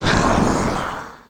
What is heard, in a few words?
ghost; kult